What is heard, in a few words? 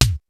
XR-10 K+SN+H, drums; Rubber Chicken Software Sample Bank; DRUMS + PERC